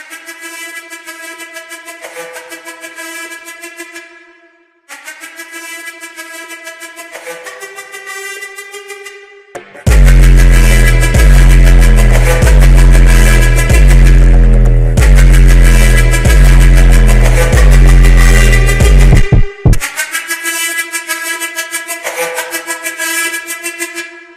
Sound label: Movie Music Ringtone